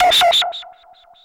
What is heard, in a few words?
ALARM